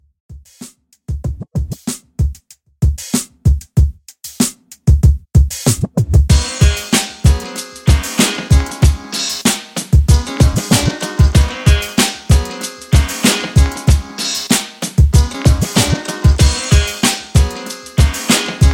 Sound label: 80s Redrum 95Bpm Clean